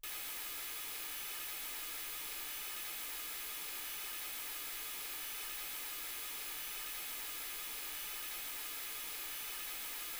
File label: mls16 48kHz